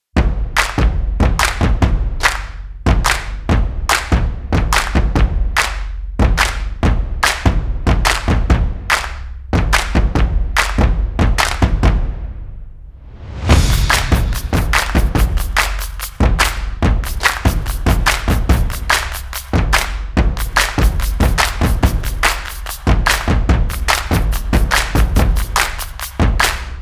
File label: The Clap And Bang Rhythm instrumental